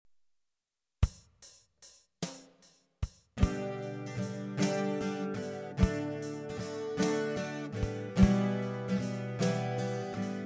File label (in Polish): G-dur